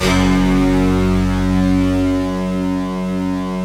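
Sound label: fast attack fuzz, Fuzzy Stacks; Optical Media International; Sonic Images Library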